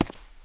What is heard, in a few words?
step stone; people; Pirates Of The Caribbean